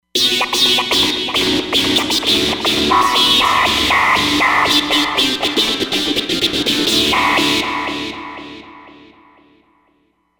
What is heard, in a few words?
Voxulizer, synth